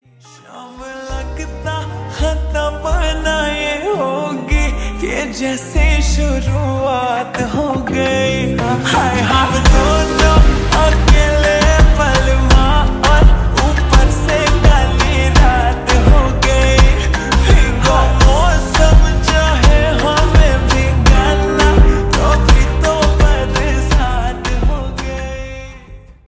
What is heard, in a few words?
Slowed Reverb Ringtone